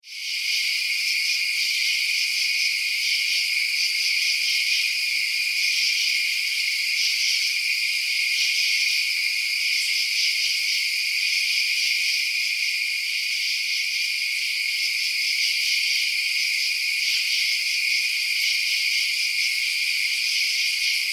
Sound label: Insects Night, Ambience, Insects, Night; 5.1; Sound Effects:Science Fiction; BlastWave